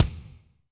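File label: Kick 22 inch Power Room, Alesis D4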